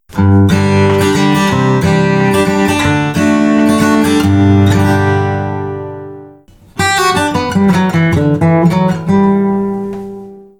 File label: Bone and Ivory Picks; Slonovaya kost